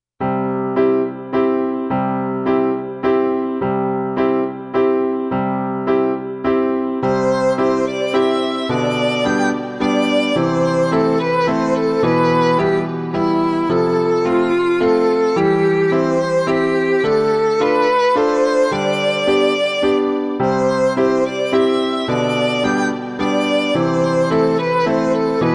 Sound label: short waltz